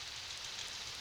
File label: Background Noise